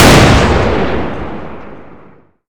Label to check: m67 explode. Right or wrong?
Right